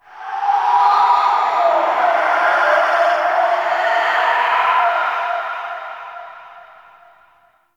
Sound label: Death Wind Gust High; Ambience; One Offs; Red Night